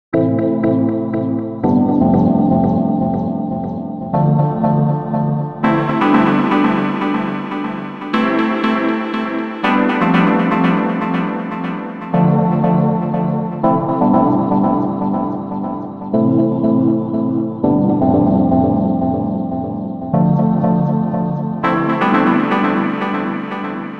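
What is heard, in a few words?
dual delay in phase feedback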